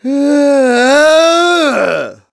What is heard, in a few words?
Moan Snarl; Ghirahim snarling about Zelda's escape; Skyward Sword